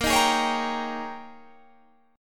Ab Major 7th Suspended 2nd Suspended 4th Bb, Guitar